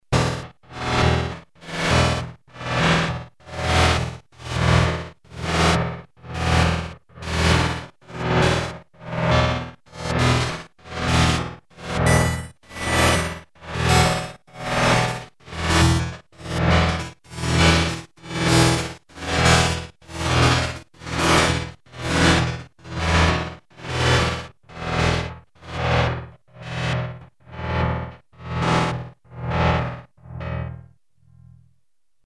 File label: synth vector fm, yamaha sy99